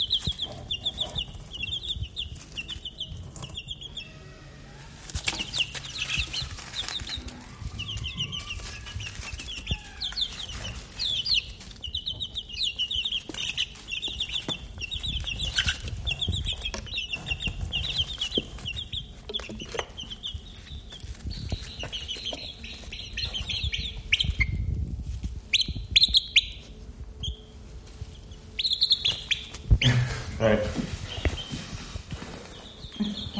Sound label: CHICKENS